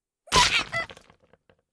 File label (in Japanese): 脳が潰れる